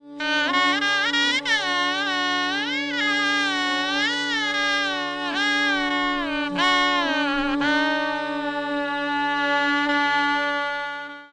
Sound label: shahnai; musique indienne